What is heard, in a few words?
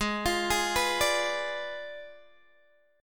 Ab Diminished 7th Ab, Guitar, Open D